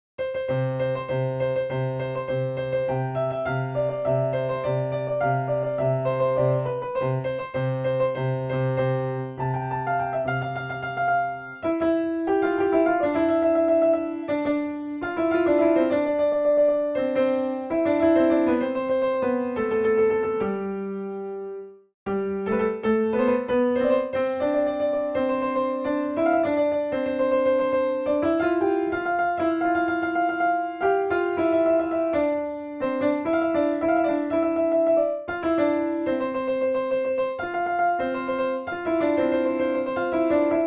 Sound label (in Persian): Naghmeh, piano; Rast-Panjgah; Radif Mahjoubi